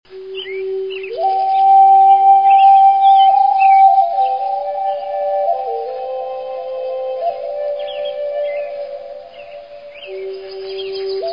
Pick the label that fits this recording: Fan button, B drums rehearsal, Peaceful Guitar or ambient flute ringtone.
ambient flute ringtone